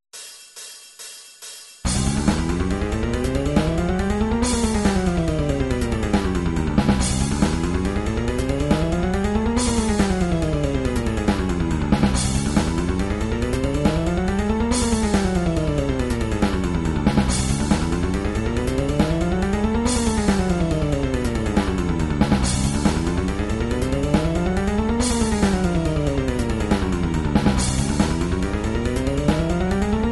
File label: round 140, guitarra playbacks; gambale